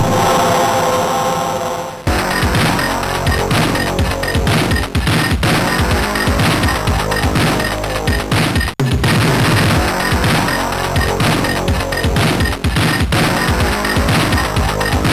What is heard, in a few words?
intro sid MOS6581R4; Hugenroth Andre and Frank; Boom intro